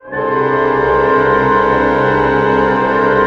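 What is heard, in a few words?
cluster pianissimo, Orchestra; Sound On Sound - Peter Siedleczek's Orchestra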